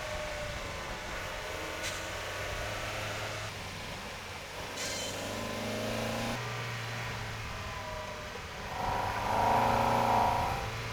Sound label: mx5 weasel